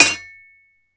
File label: Saw Metal Bounce